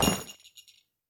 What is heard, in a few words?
Item drop ring shop